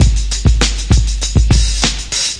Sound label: 100 80s top 40 rap track, drum loops and breaks; 091 to 100 bpm